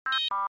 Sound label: Device Turn Off Beep; beeps